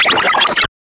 16 bit pixel art very

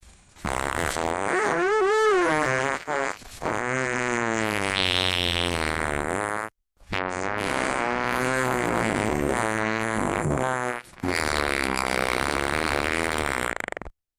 fart sound plays death

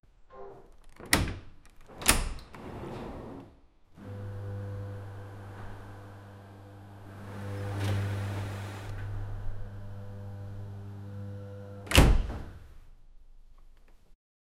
open automatic door

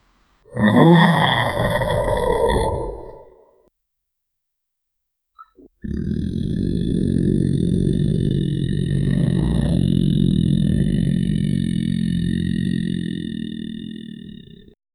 dinosaur sound making

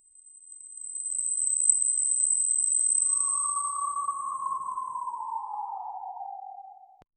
character dissolving into, dissolve

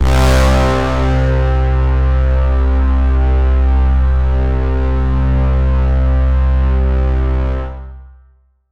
synth brass one shot

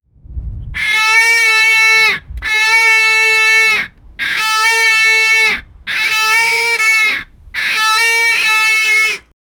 a tyrannosaurus, dinosaur

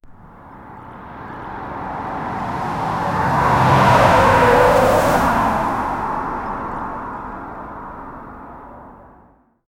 cars driving past slowly; neighborhood

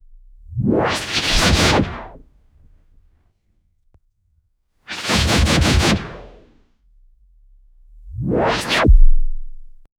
slow motion whooshes

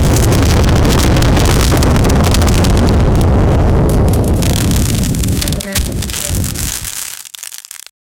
fire damage in a video

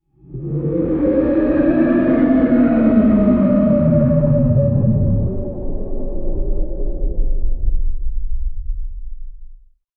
blade gleaming sound

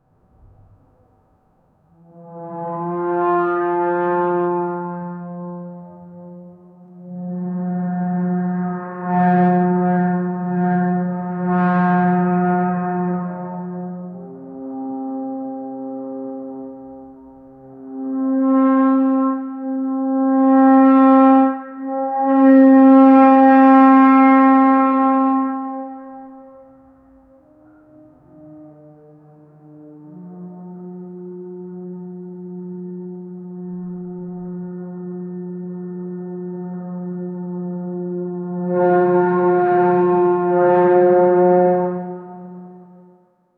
french horn trombone euph, chase

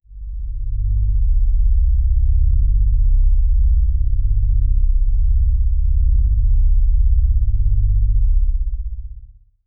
start with low harmonic drone

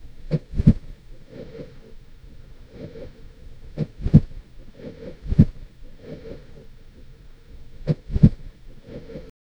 diver breathing inside he; realism

